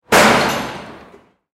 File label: Crash sound effect